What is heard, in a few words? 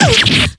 damage; yukiko